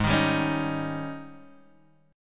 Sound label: G sharp Major 7th Suspended 4th G sharp, Guitar Half Step Down